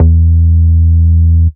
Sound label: Root E, Bass